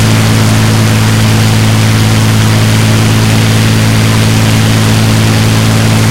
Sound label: spitfire rear, engines; War Thunder